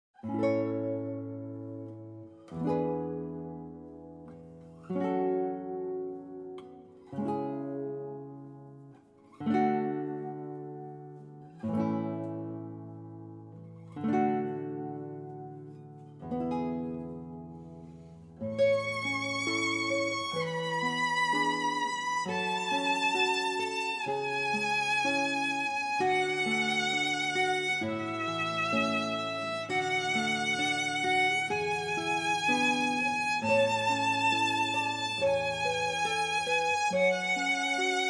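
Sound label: Duet Guitar Violin; Classical Guitar; Pachelbel, J. Canon (Gtr/Vln Duet)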